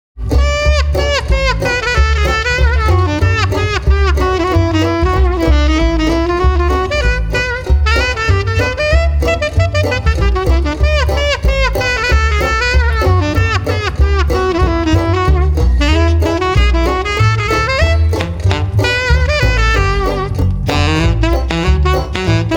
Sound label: sax o phun